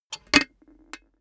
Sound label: cash box metal lid close hard; maschinen; soundpool